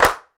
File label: clap d key, Royality free clap single hit tuned to the D note. Loudest frequency: 1542Hz; percussion; single hits one shots